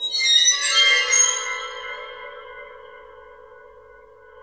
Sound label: WATERPHONE; WATERFN